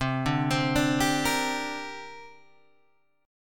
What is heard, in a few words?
C 7th Suspended 2nd C down Guitar Open G